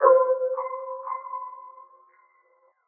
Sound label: BELL (C), Pedal One Shots; Skill; Cymatics; ANALOG EVOLUTION Beta